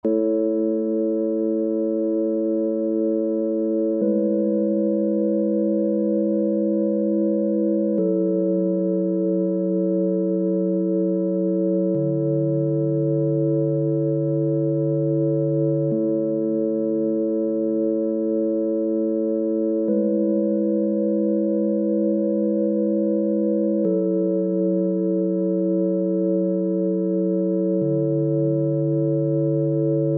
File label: Beat; eerste demo